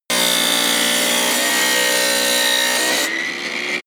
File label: tile cutter on load